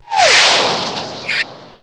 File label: Quick Rocket Flyby; PC; MARIOTEACHESTYPING2